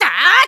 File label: Spin Attack; Link's spin-attack shouts